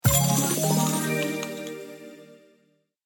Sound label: coin Fly